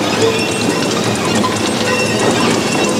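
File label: A#4 squawk; WAX SPROCKETS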